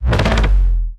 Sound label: Jolt Standard